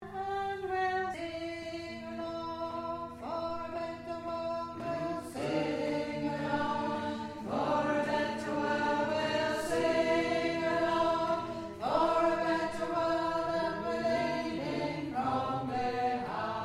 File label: Bass; We'll Sing along